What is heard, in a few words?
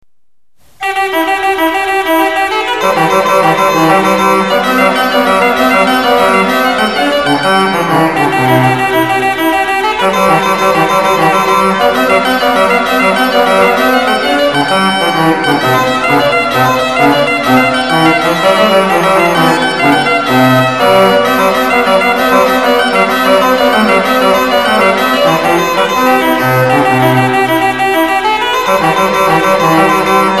fanfare; orgel van Boda in Zweden (Horizontale trompet); Het is een fanfare van een anonyme komponist